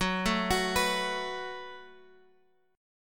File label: F sharp Minor Double Flat 5th, Guitar, Open G; chordshape; down